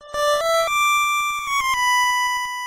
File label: Flute Played; Friday Night Funkin' Mario's Madness; PC mod gamerip